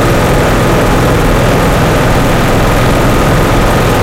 tiger diesel engine int rpm 66 load, engines tanks; War Thunder